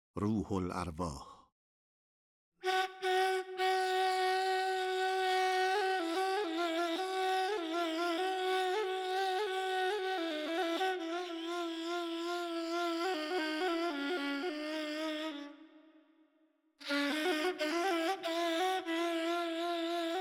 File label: Ruholarvah Bayat-e Tork Radif-e Ney; Avaz-e Bayat-e Tork